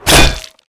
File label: blade flesh